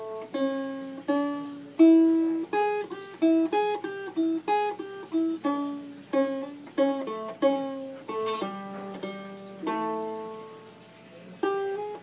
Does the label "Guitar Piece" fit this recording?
Yes